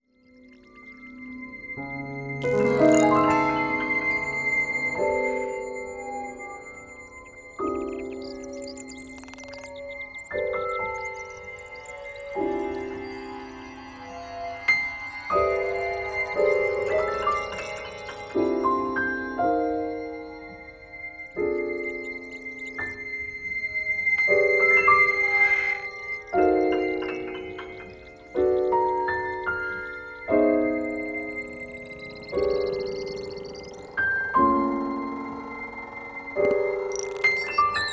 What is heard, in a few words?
Shimmer